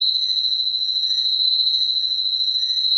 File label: Alien Locusts Loop